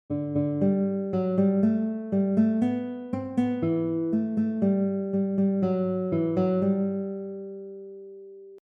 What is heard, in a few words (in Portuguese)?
melodia escala maior; guitarra; melodias na guitarr com escala maior